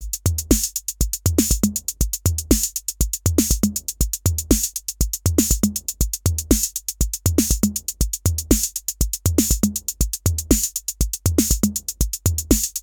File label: Roland TR-06 Boutique Beat